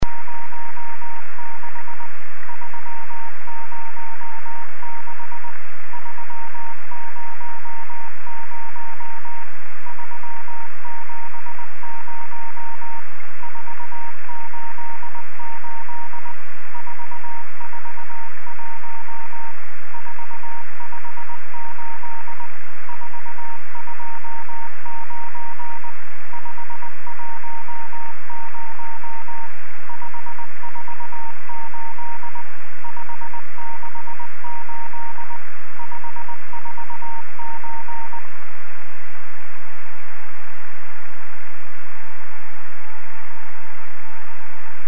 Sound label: beacon, amsat